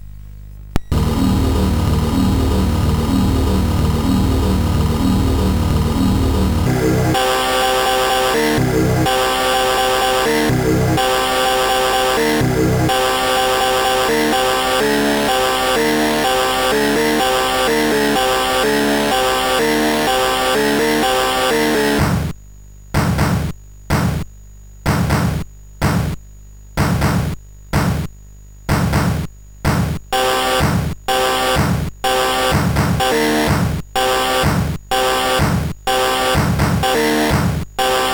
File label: Noisemaster, CSG8580R5